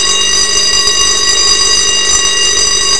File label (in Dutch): schoolbel